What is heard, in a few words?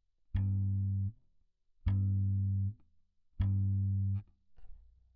bass